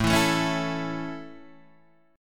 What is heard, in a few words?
A Major A Guitar Half Step Down, chord